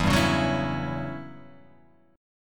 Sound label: E Minor 6th Add 9th chord